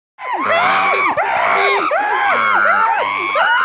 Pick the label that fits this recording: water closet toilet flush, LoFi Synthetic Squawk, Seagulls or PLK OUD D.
Seagulls